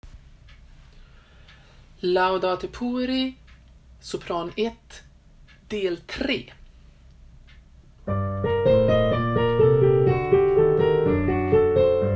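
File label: Laudate Pueri soprano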